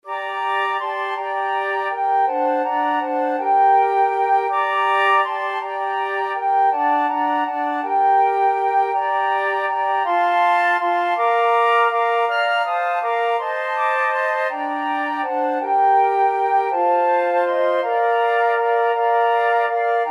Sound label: we three kings, flute quartet